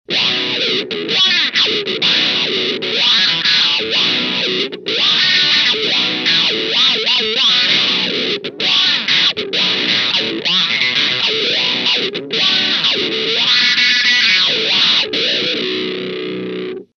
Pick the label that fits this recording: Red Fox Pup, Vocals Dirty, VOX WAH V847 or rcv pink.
VOX WAH V847